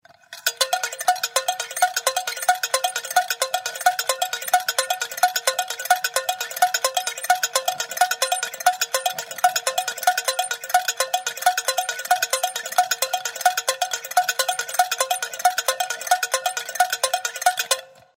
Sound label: Winding Music Box, Medium reverb